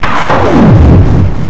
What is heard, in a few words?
shot